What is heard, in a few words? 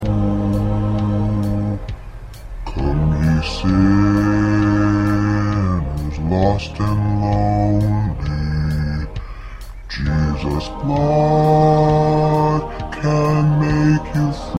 P Bass